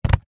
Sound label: vehicles car battery set down on ground gently